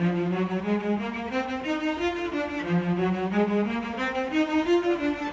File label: Cello, Orchestral; Sonic Foundry (Sony Creative Software); Orchestral VOL-2; Modern 07